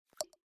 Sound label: Unlock